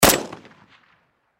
Gun Rifle, 7.62 Caliber Dragunov Svd Bolt Action Single Shot; Distant Perspective; Warfare; Blastwave